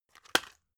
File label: drug package drop hard; arzt